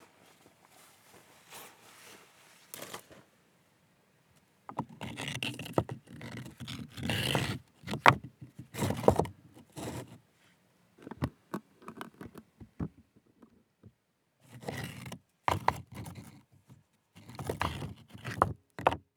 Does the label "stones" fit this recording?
Yes